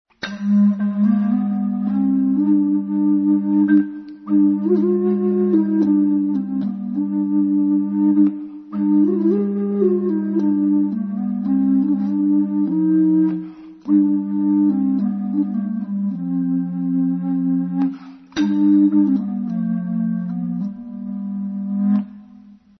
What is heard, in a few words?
huaca